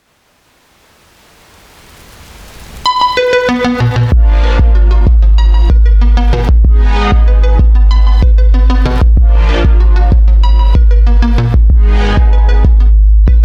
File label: Garden Of Paradise (Instrumental)